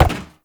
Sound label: Uriel Step Metal; Warframe; Movement